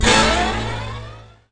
Bonus Sound; Ruckus

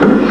Boosh

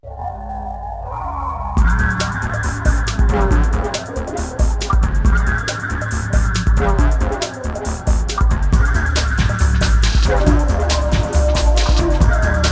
Front Loop, General Music; Urban Chaos

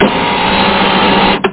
ROTATE; Amiga Format AFCD47; traitor; mech sfx

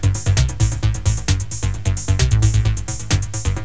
electro; Guitar&Electro

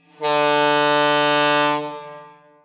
Tug; Spec Ops 2

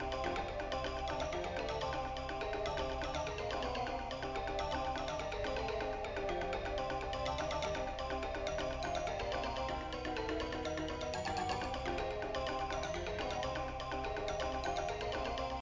tune; blix

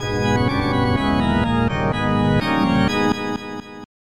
game Music; dr strange ii

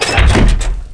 SUSPEN; mtm2demo; PC Gamer 4.2

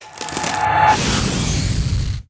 attack elf fire; FantasyTennis

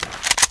mp44 pickup, weapons foley; Spearhead Demo; MacFormat 2003 June

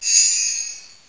magic bonus; Ferazel's Wand

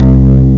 green bass; Epic Collection 3, The; Epic Marketing; muzak

